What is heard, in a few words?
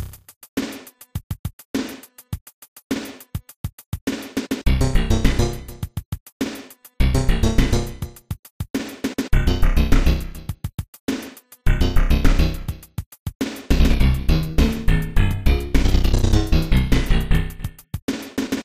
Underground; smw; smw1.7